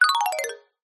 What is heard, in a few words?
reset